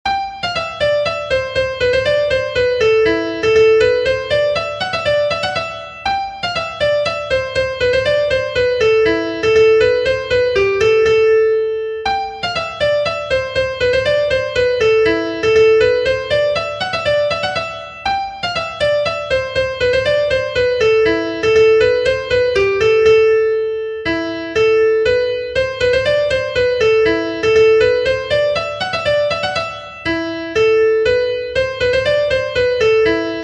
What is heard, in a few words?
Polska; Haellesforsnaes